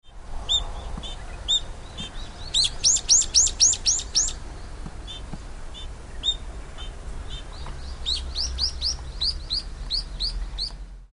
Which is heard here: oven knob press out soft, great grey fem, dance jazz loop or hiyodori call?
hiyodori call